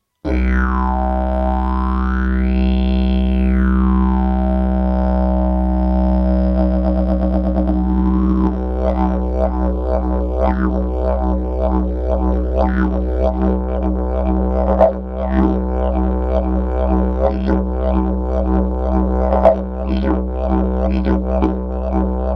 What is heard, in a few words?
didjeridoo